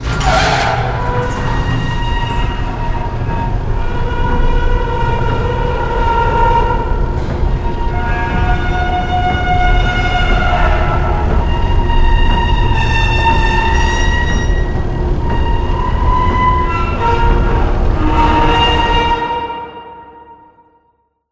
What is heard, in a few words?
elevator calldown; elevator distillery; scripted